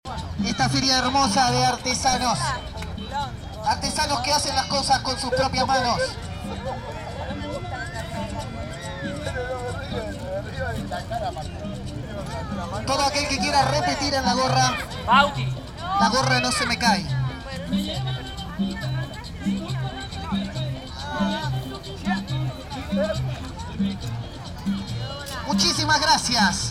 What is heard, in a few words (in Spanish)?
recorrido parque norte